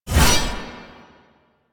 Blade Swipe; Melee; Dagath

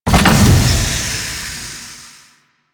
Turret Overheat; Weapons; APC; Warframe; Lasrian

